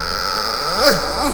VOX GENERAL; Megabass Remix! 2

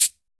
POP CABASA P; R+B KITS2

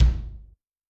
2 Head Lo, Kick, Yamaha DTXpress-II

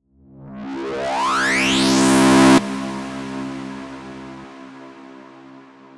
Tone Riser (C2)

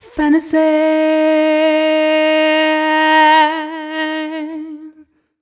f mel e flat, fem Vocals

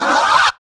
FX Dance

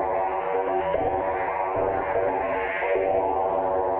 Tonal Re Draw, sparse soundscape; Loops

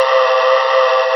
vox noise C3; Big Fish Audio; Synth City

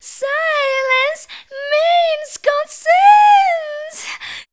SILENCE; Best Service; Voice Spectral II

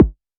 Multi-Kick Short

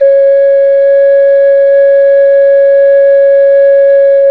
OCARINA C#3

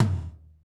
TOM, R&B Kits, Roland; Northstar - Drumscapes Roland; Wet Kit

